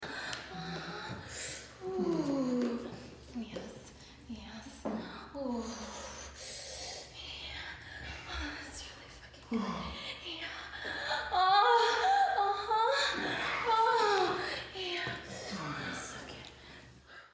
moan

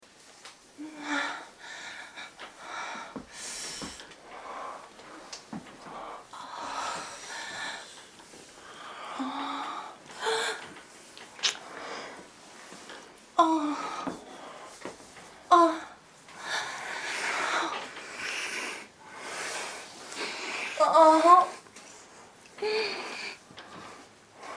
moan

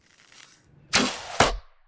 bow draw and shoot; combat, ranger; A succubus is born

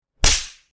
spank; Inseminator

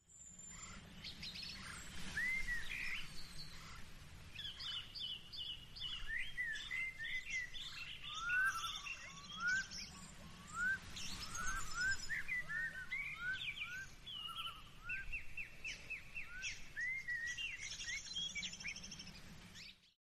outside; Inseminator

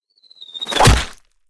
precise shot, combat ranger; A succubus is born

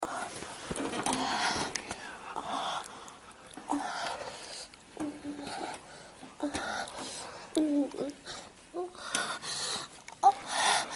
moan

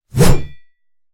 sword strike, warrior; A succubus is born; combat

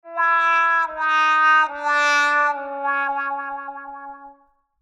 fail; Inseminator

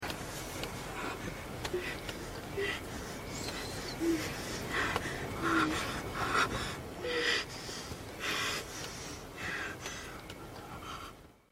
moan

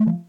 incorrect; BTD; hospital; patiens

